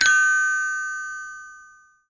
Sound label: ding dong bell doorbell; salamisound